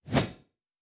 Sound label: Whoosh; Fantasy Sounds Bundle